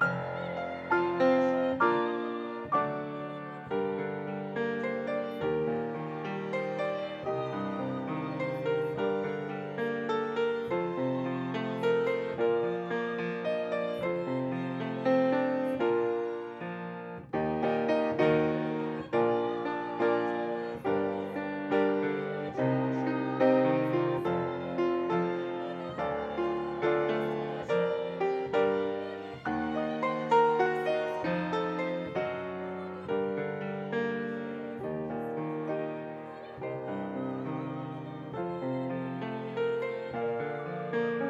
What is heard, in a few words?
Piano